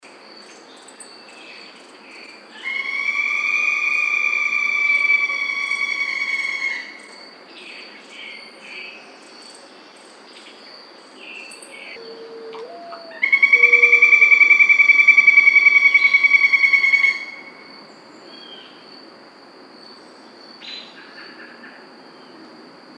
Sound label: Northern Flicker